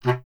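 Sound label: wind bass clarinet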